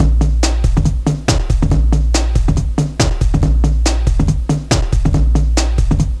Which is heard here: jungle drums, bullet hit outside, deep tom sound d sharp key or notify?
jungle drums